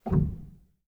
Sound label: rel, Grand Piano; bitKlavier Grand; Piano Bar